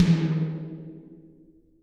Drums, TOMS; Best Service; Real Mega Drums VOL-1

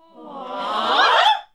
noise, classic vox; Best Service; Hallelujah

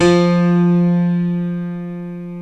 DBL GRAND; OMI - Universe of Sounds

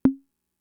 Conga, Fricke MFB-522

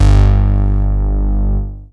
BASS G1; Best Service Dream Experience; R100M BASS

